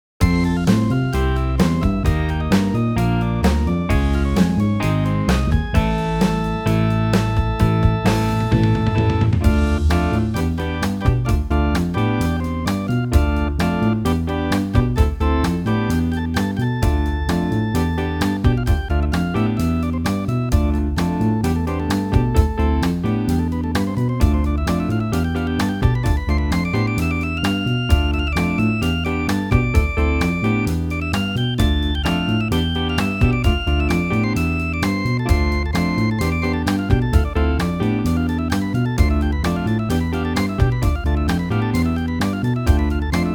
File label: Electric Piano Bass; sampletank4; electromagnetik